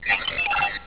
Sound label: beep; xfce